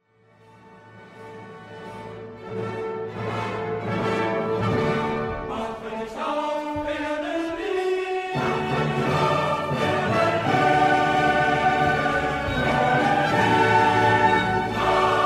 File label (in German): Chor; Paulus: Chor - Felix Mendelssohn